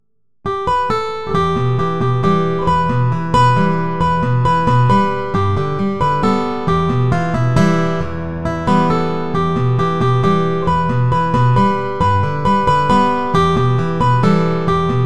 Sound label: guitar